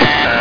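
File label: button deny; caged; buttons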